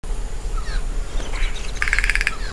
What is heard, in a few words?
Cyanocorax chrysops rattle mew